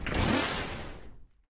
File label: Shield Collect; Shield Space